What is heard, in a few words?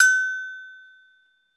Afr Agogo; Club-50 - Foundations Roland; Agogos; Fiji